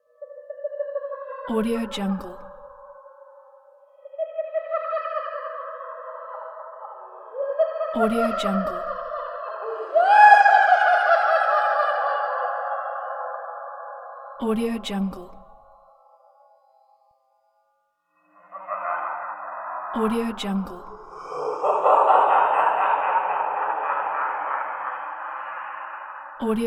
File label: laughter ghost